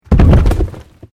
Cardboard box strike; SoundEffects Foundation2

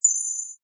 Beep Sweeper Musical Long; Scifi Production Elements, Set 2; Lynne Music

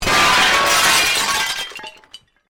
Window Large Smash, glass pane smashes; A series of glass pane smashes created using FMOD; Windows; Household

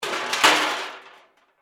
Crash Metal Object, Crash, Metal, Objects; Crashes (Sound Effects:Objects); SoundEffects-BlastWave

